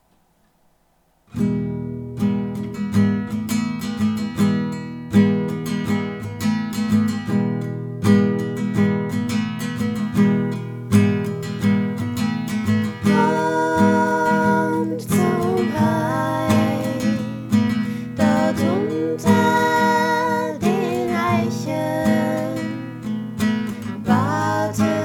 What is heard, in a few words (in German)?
2 stimmig